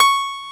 M1 PIANO; SoundFactory

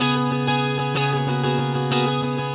Acoustic Guitar; Guitars

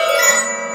WATER; SoundFactory

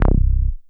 Synth Bass Single Note; Basses

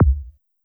Kick One Shot, Drums

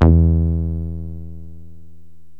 mono bass, yamaha rm1x synth bass and lead

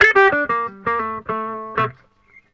Electric Guitar, Loops; Guitars

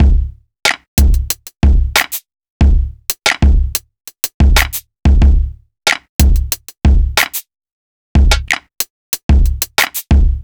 Urban Beat, Drums

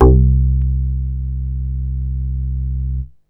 BASS; SoundFactory; SMPMANIA

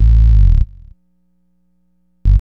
Synth Bass; Basses

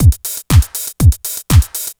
Dance Drumloop; Drums; Dance Beats

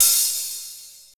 Hi-Hat One Shot; Drums

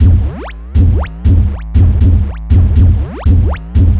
Electronic Percussion

